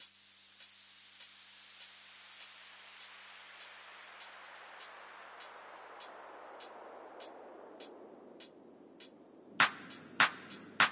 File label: Drums and FX Only